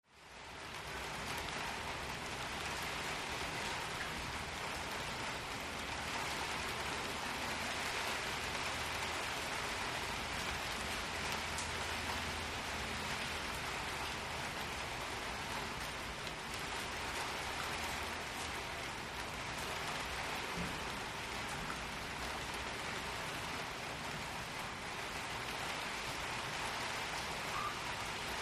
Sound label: Rain Heavy On Outdoo, int